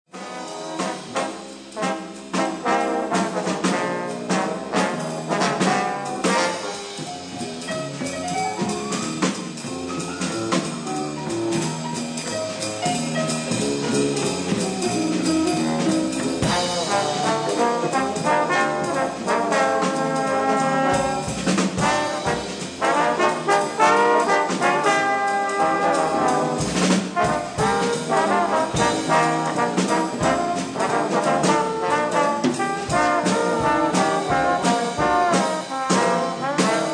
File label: trombones coup